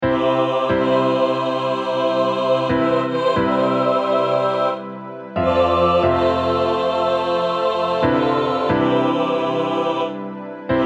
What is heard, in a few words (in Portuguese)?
Coral; Amazing Grace Parte 1